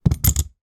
industrial tools wrench adjustable large put down on carpet floor; sound effects one